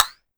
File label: Woodblock Up, Pitch Env Various, Clavia DDrum; Clavia DDrum-AT